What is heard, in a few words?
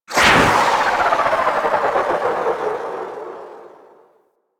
Archwing Healer Engine Boost Wind Down, Vehicles; Warframe